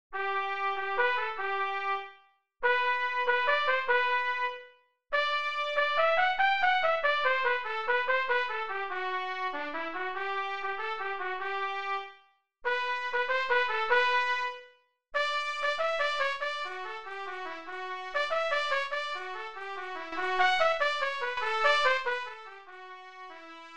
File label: trumpet; UVM Band